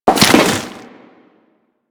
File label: Crewman Land Dirt, Footsteps; Warframe; Corpus